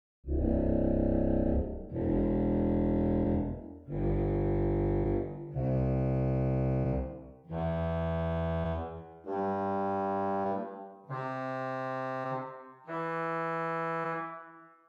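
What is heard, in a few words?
Pedal Trombone 16 arpeggio, organ stops; Hauptwerk; St Annes Moseley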